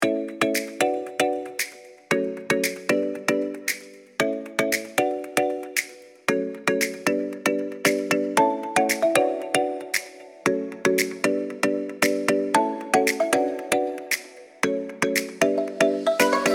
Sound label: music